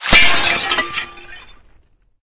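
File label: ice break; GZDoom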